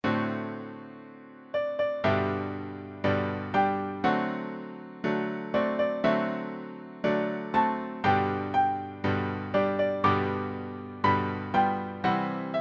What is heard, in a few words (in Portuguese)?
harmonia e melodia